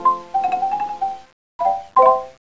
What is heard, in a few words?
MARIMBA